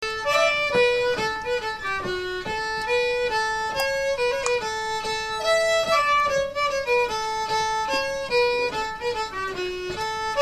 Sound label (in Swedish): mazurka